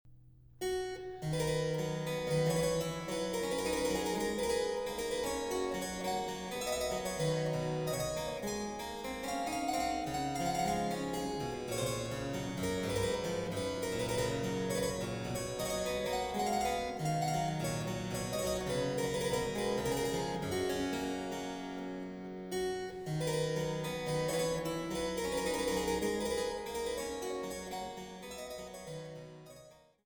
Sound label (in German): Solo 6, C-Dur für Cembalo solo